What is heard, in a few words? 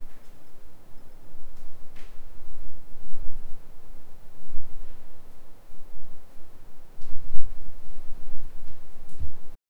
creaking spiral stairs, cavern